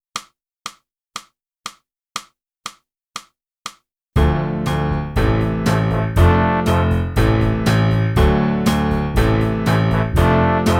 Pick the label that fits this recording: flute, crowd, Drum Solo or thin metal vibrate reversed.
flute